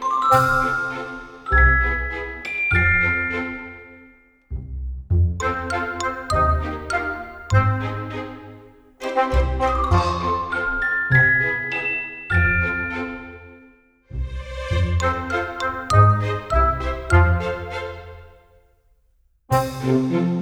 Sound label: main ambience; netent